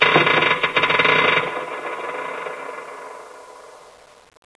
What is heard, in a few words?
distant creak